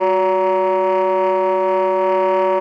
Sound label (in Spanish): Tremolos